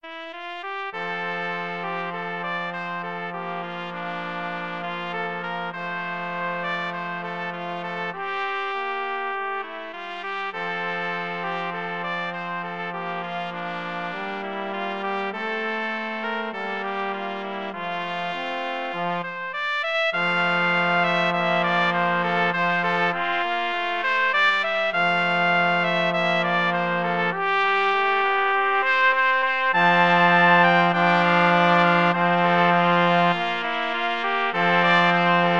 2 trumpets trombone

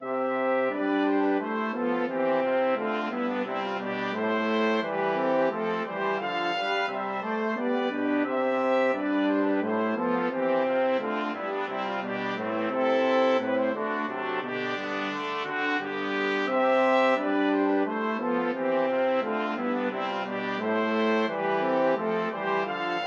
hornpipe, flexible brass ensemble 4 players

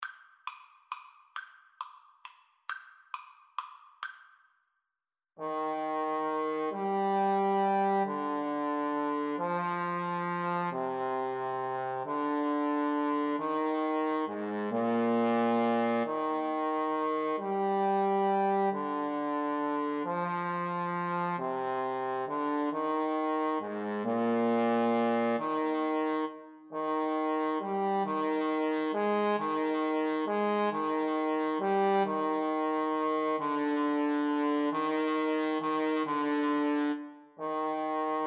sonata 331, Trombone Duet

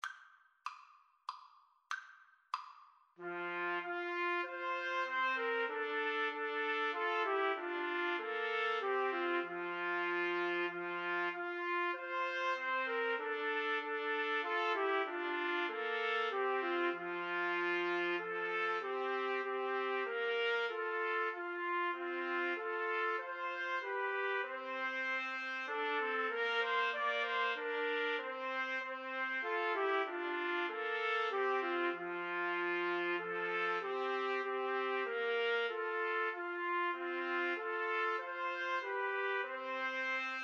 TPT trio, Trumpet Trio

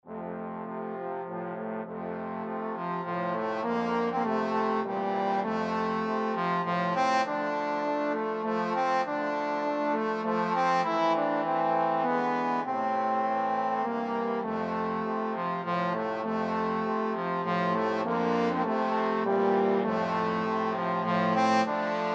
trombone quartet

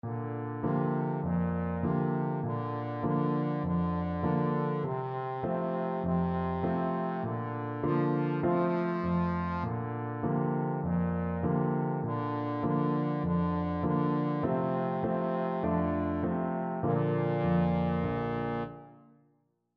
trombone

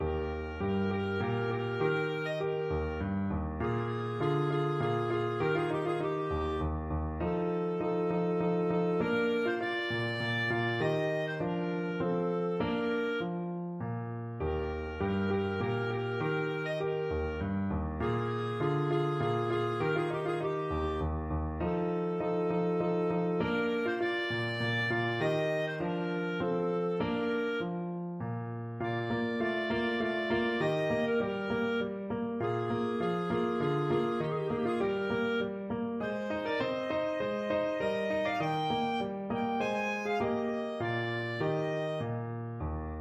Minuet from Don Giovanni, clarinet

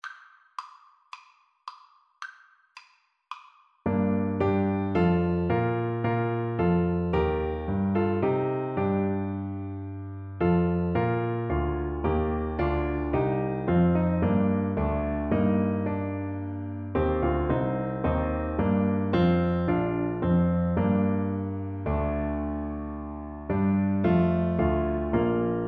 emmanuel, french horn

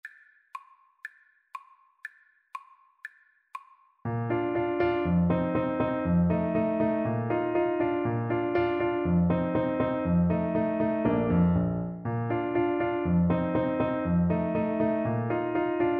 ecossaise, Bassoon

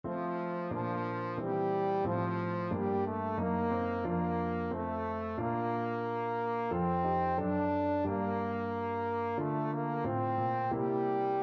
all my heart, trombone

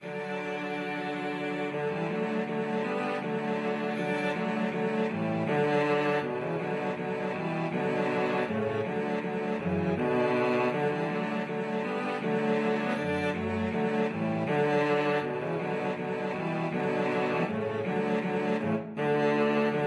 slavonic, cello trio

